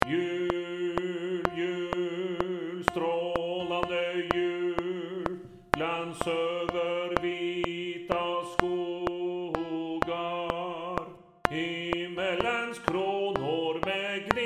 tenor 2 click

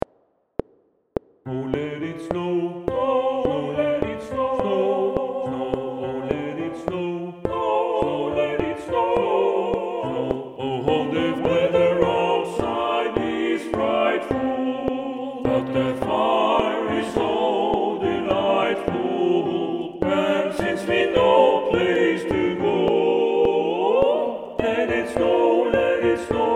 TUTTI PIANO CLICK

